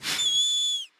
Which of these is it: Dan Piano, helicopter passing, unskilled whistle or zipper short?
unskilled whistle